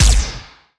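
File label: Laser gun Fire; Lasrgun Hyperion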